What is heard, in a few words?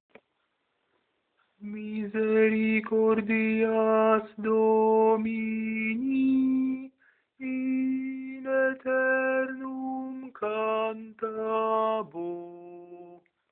Misericordias Domini tenor